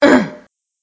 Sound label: grunt female